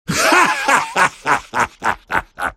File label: ability dismember laugh; Pudge